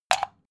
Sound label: socket plug